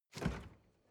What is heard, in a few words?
refrigerator door open hard